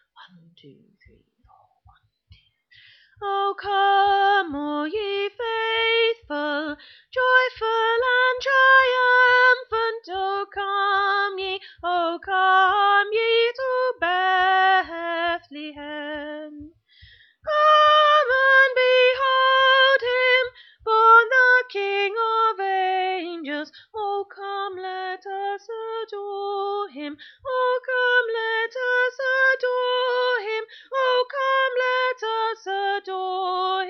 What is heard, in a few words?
Soprano